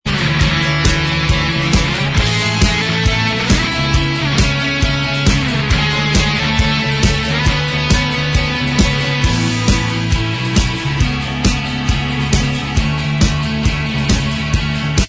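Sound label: instrumental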